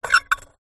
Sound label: foley metal sharp edge scrape on metal pan squeak